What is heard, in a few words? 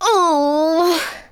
Emote Mistake; Twitch Skijump